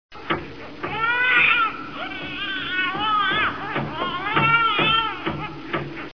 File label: Baby Crying